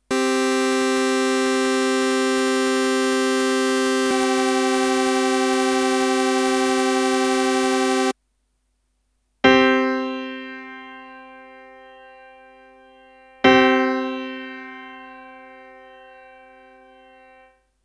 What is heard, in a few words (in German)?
wolfsquinte; harmonie